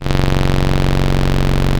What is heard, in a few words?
tuba loop note